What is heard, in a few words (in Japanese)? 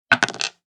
サイコロ二個を振る; ボードゲームやカジノ、麻雀の効果音です。